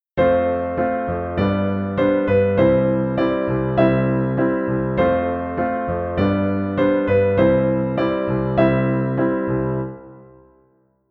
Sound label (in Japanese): 進行の起伏 F G C C, piano